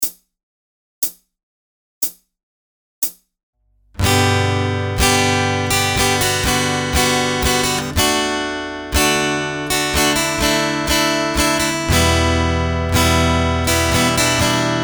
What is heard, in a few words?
Embellishing the Modern Strumming Pattern SLOW, guitar; Ultimate guide to strumming book